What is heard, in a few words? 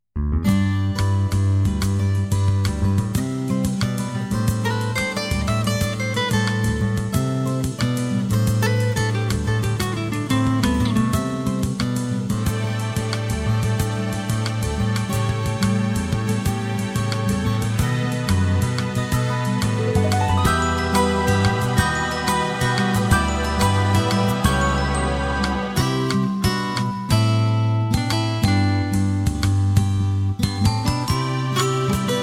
guitar